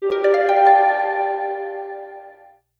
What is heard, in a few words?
harp bow boost